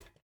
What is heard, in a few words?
footstep burlap metal jump start; Rust